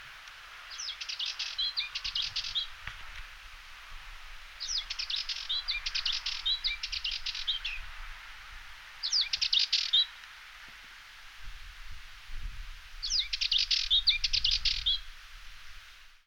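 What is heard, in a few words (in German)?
Brillengrasmücke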